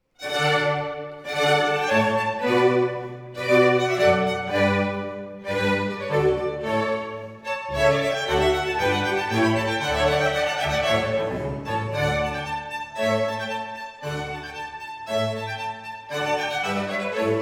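concerto in d major for violin strings and b c allegro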